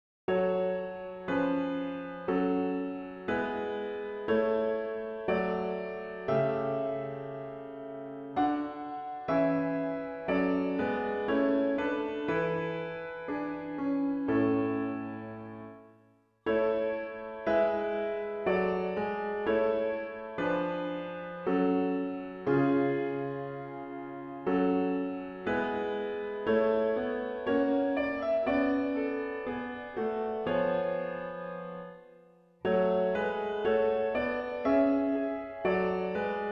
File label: Piano